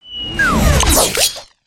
Weapon Fall

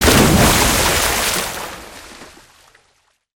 water splash close; destructibles

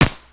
Seagull Steals Frisbee; creatures organic

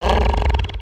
Tentacle Monster Growl; destructibles

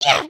headbang; emotes

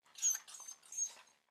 Pet Dog Small Idle; creatures; pets; Lego

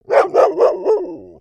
doberman bark; creatures pets

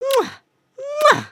kiss

